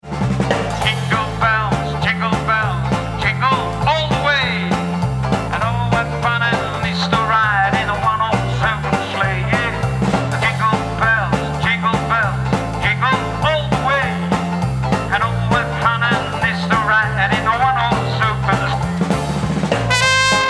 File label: jingle bells swing